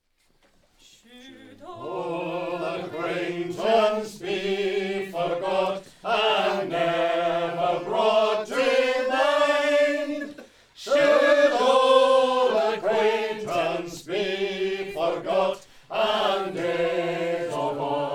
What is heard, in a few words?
group singing auld lang syne, glasgow